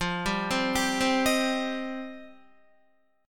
F 7th Suspended 2nd, Guitar; F7sus2; arpeggiated

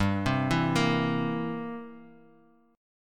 G 6th Suspended 4th Sharp 9th, guitar chord; G6sus4#9 arpeggiated; JGuitar

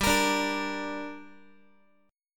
G Minor G down Guitar, chord; strummed; JGuitar

